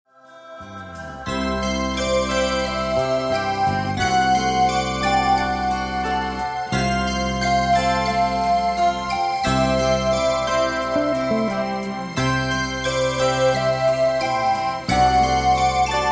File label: Karaoke